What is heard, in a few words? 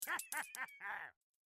pirate laugh; peter pan